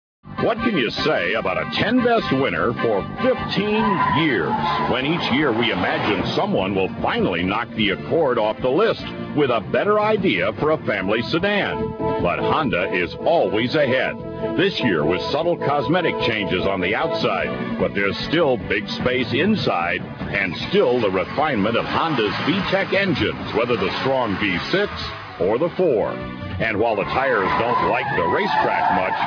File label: honda accord, car